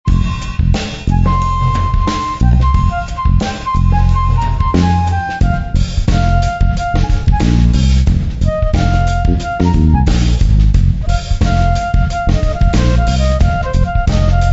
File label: Flutey Funk